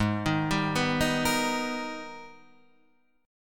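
Ab Minor Major 9th chord, Guitar, Half Step Down tuning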